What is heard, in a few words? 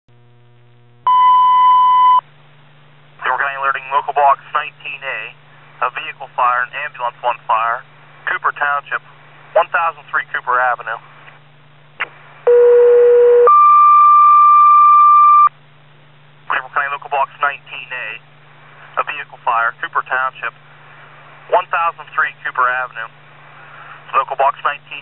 ambulance fire; clearfield